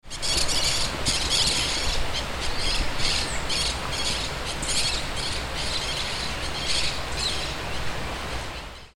Pyrrhura frontalis Tiriba

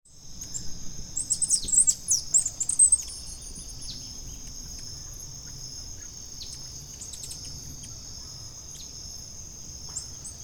Tangara cyanoventris Saíra douradinha

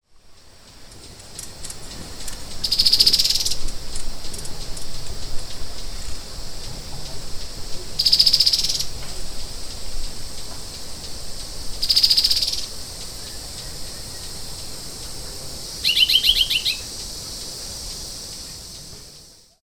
Euphonia violacea Gaturamo

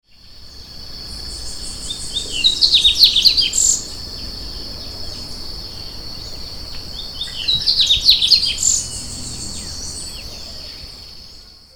Sporophila caerulescens Coleirinho

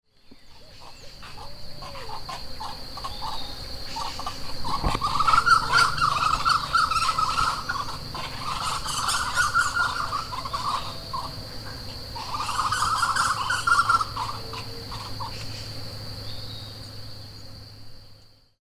Crotophaga major Anu coroca

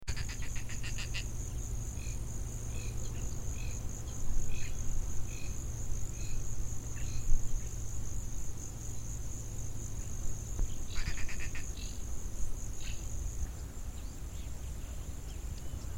Rostrhamus sociabilis Gavião caramujeiro; Macaé RJ Jurubatiba

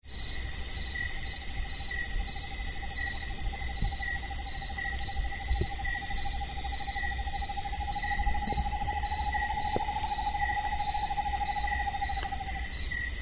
Megascops atricapilla Corujinha sapo

